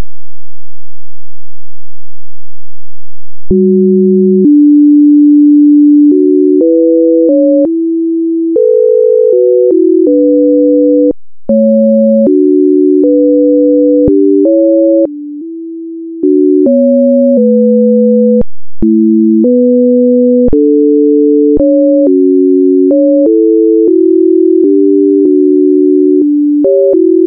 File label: d maj